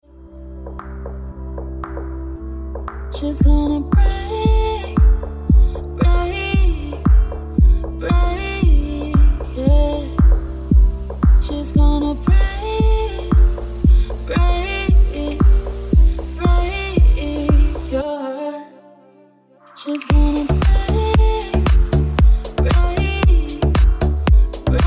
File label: Ringtone